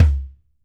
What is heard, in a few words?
round kickdrum sound c sharp key